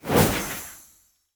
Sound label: wild spread; netent